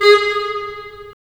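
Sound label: WND CL, wind clarinet; LA Composer vol. 3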